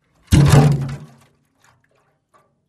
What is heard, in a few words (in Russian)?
zvuki kanistry